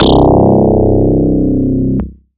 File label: Yamaha TX81Z Synth Funk Bass C4